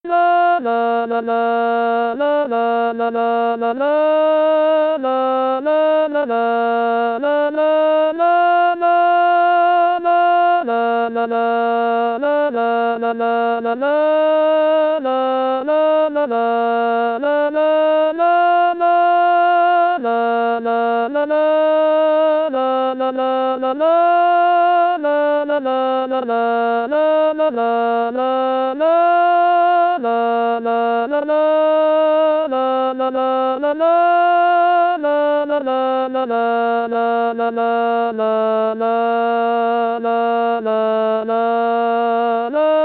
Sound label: alto; Gwir vretonned